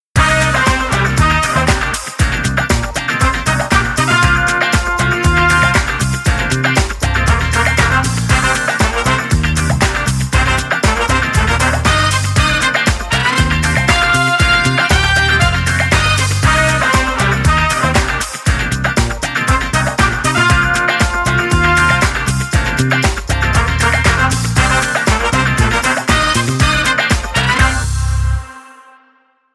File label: Funky Disco Fever; MIUI14 and HyperOS 1.